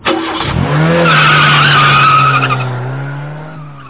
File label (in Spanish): carro arrancando